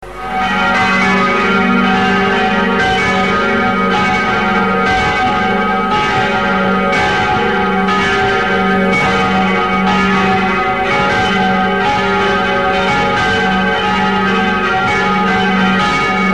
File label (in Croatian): zvonovi